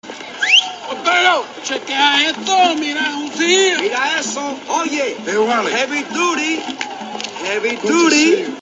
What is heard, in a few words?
heavy ringtone